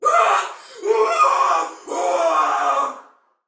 Death Fire, Female, Soldier; Dialog; Barks; Battle; Sinecure

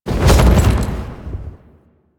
Horse Jump Heavy Double; Foley Actions; Warframe; Vehicles; Duviri

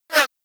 Revolver Pistol Whizz

